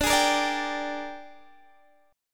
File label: A sharp 13th